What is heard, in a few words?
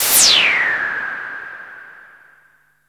NOISE SHOT; Best Service Alpha Dance vol.2